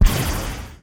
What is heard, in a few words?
Magic; ChooChoo Heroes